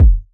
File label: kick; Rialasten 2022 Xmas Sample Pack; 2022 Xmas Packs